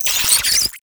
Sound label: Oraxia Brood Spider Vocal; Warframe